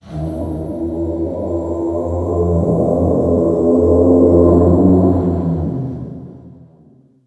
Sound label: alien; quake2; zaero